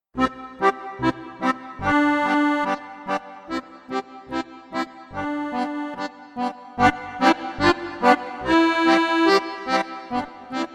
Duet

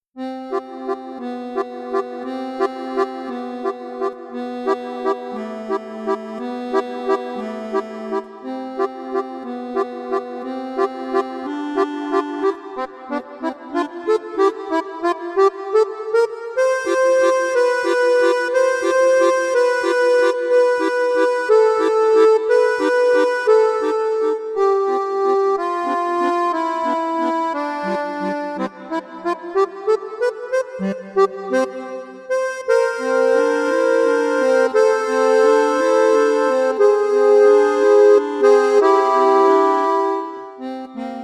Little Waltz

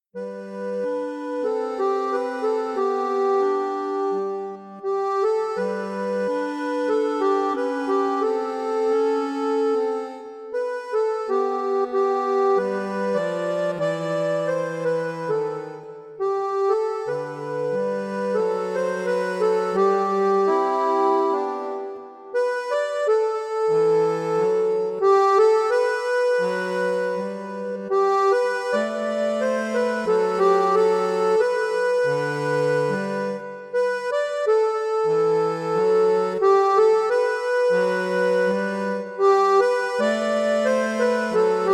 Western Song

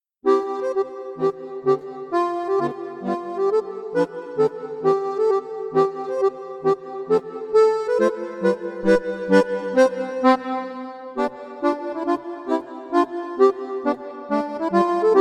Pleasant Mazurka

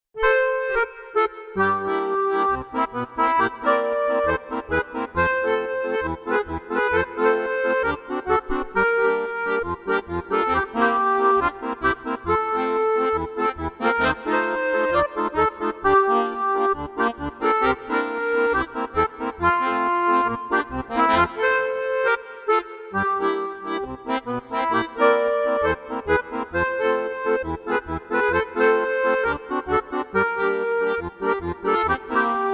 Weekend Beguine Duet

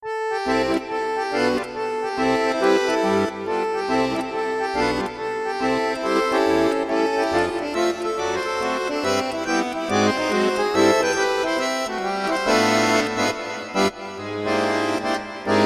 Swing Time

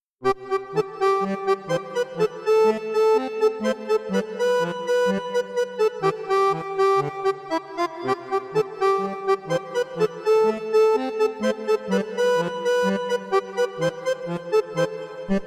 Happy Foxtrot

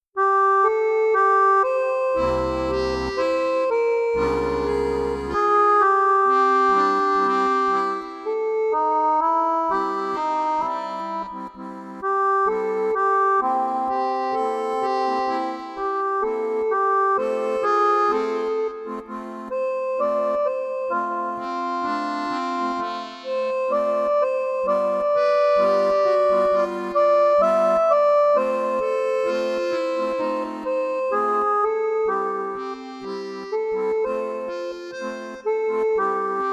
Cinema Blues Duet

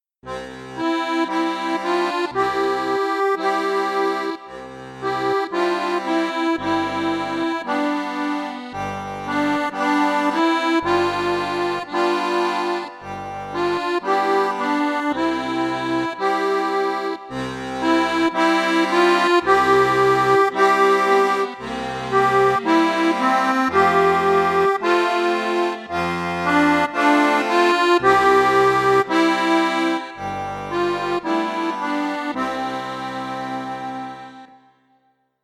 Country Song Duet

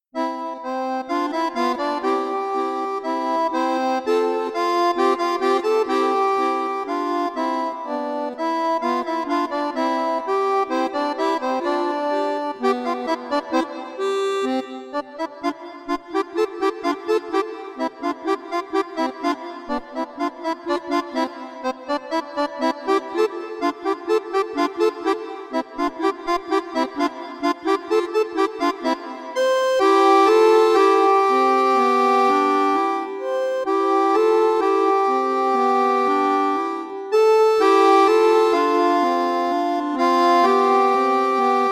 Cowboy Tango

